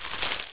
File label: trash